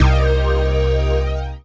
Dance Mega Synth; Best Service; 90 s Sample CDs

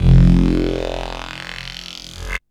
FX, Drum & Bass

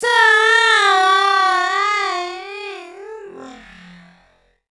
Female Stretch Vocals; Houseworx

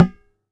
Tabla Baya; Roland BK-5; Percussion

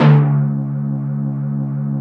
timpani, Yamaha TG-500

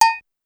Cowbell Heavy, Pitched Up, Clavia DDrum

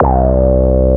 QUAC, QUACK SOLO, Synth

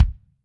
KICK, Drums Percussion; Kick 1 ModuIe

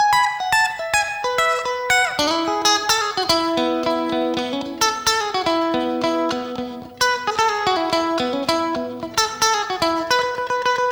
TWNSHIP, S.AFR.GTR; Spectrasonics; Heart of Africa 2

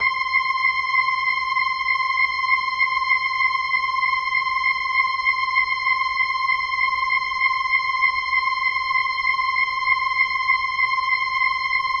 Rotor Riff, Organ

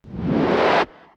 FX, retro house

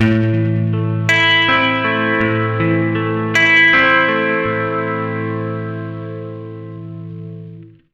80 MIN ARP A, Acoustic Guitar Loops; USB Soundscan vol.04 - Electric & Acoustic Guitar Loops; AKAI

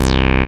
TB303; Best Service Gigapack 1; SYNTB303

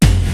INDUSTRY HIT; Rubber Chicken Software Sample Bank; DRUMS + PERC

